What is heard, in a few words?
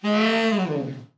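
TENOR overblow FALL, Sax; TEN-OVB FALL; Giga Samples Collection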